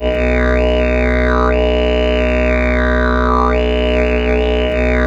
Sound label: B drone simple, Didgeridoo; Big Fish Audio - Didgeridoo and Other Primitive Instruments